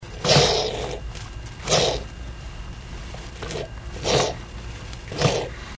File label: male hiss, poorwill